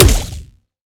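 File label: Sci weapon Shot Small Blaster Anti Matter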